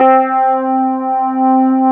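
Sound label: Wurlitzer C4, Keyboards Old School; USB Soundscan vol.09; AKAI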